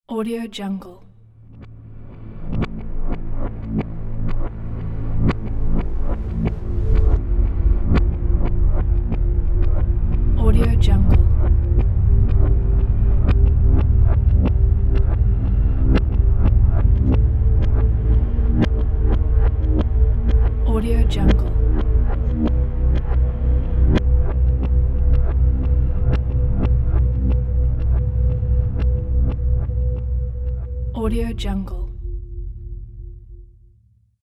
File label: high tension; ceku